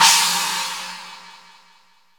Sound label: china, Yamaha RX-5